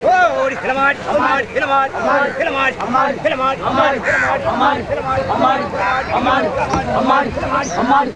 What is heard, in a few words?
VOC, India; Best Service; Ethnic Journey Sound Cube